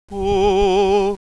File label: vibrato